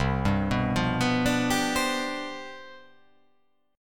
C Major 7th Suspended 2nd Suspended 4th C down, chord